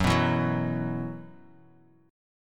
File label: Gb 7th Suspended 4th Gb down, chord; Gb7sus4; strummed; JGuitar